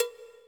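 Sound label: REV COWBELL; Greytsounds; Scott Peers Sound Engineering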